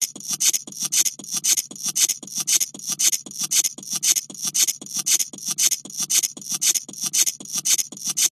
industrial machine cogs gears small mechanical sequence; zapsplat